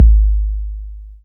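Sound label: Kick 808; Roland SPD-S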